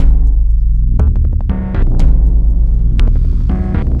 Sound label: Bodhran Blur, Drum Loop; Sonic Foundry; The Electronic Music Manuscript Collection; Drum Loop Structures